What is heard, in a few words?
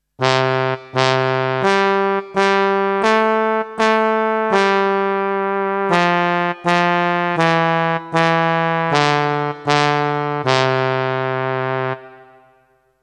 trombone